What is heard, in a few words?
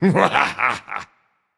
laugh; smite; raijin